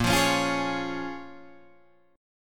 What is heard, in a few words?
Bb Minor 7th, Guitar, Drop D